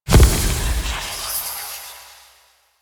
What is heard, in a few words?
Shadow Smoke Teleport; Warframe; Duviri